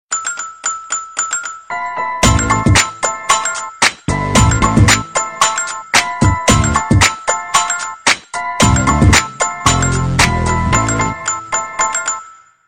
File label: msg tone; walkman